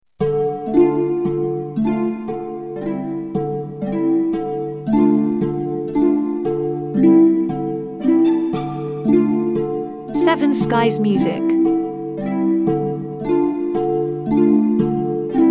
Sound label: The Charming Harp